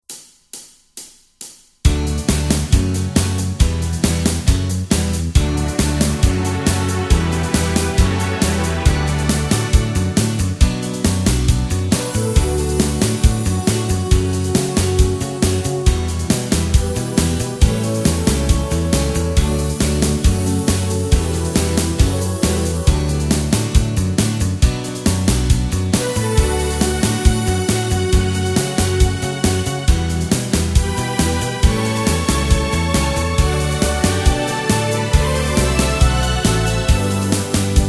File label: D No Lead Guitar, backing tracks